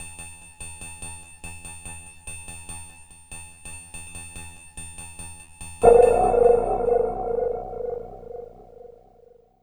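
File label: DRYAD percussion, drum loop; Big Fish Audio; Uncivilised G'rooves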